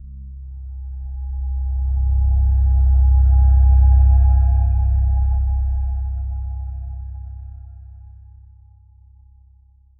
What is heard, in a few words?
deep pure c2 tone triangl, sine